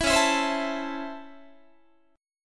Db Minor Major 7th Sharp 5th, Guitar; 19,21,19,22,20,20; 4A,4E,5A,5D,5G#,6C#; strummed

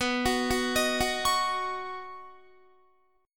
B Major Add 9th, Guitar chord; arpeggiated; 18,21,18,20,18,21

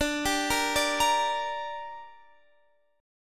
D Suspended 4th Sharp 5th, Guitar, Drop D

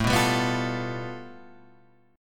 A 7th Suspended 2nd Sharp 5th

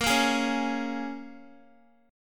Bb Minor 7th Bb, Guitar; Bbm7 strummed

